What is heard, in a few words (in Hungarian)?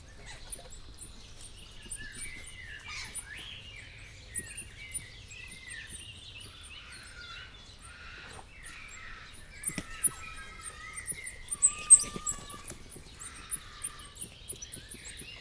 etetés, magas vékony hangocskák, ormányos medve; jaszberenyi zoo 2015